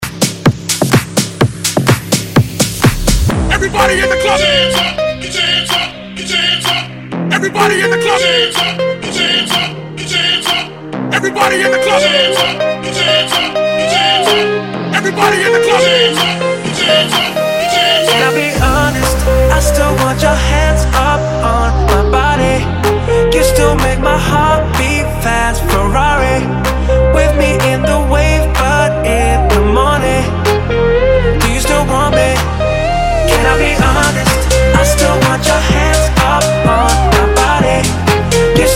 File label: Hype, 126 Bpm